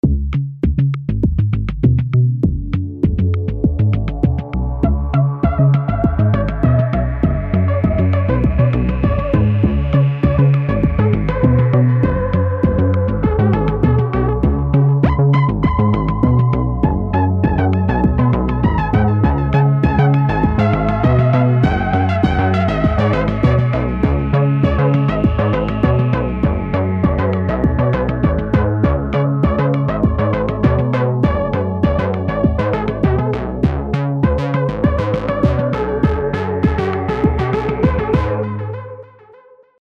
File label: electropop